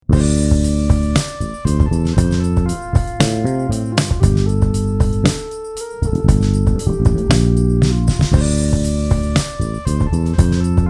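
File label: Fifth Fret D 10th Fret D, bass; Yamaha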